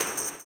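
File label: tambourine, 160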